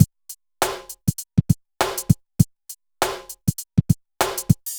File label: BPM100 SYN